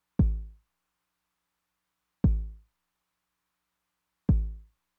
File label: kick drum w accent, Roland TR-909; drum machine; Roland TR-909 Ableton Project